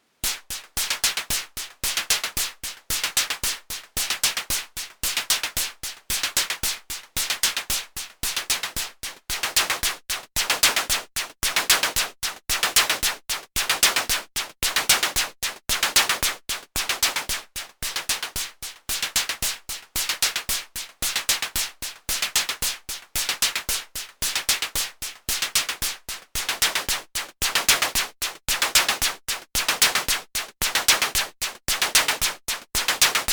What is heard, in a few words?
Noise HP; DFAM vs Edge